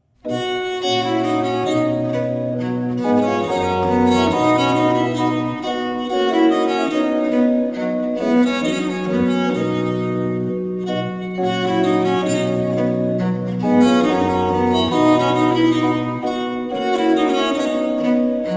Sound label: Minuet Sonata in C Major, Viola da Gamba; Johann Daniel Hardt; German Viola da Gamba Sonatas